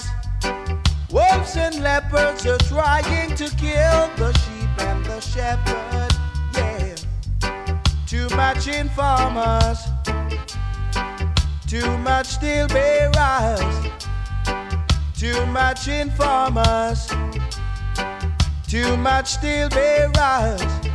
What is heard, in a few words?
reggae